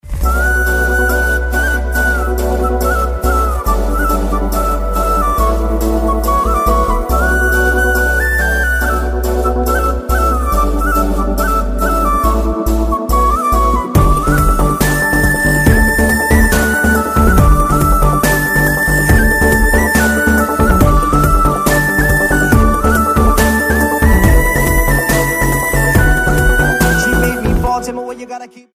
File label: Flute